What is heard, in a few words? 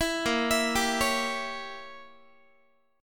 A sharp Diminished 7th Guitar; arpeggiated; JGuitar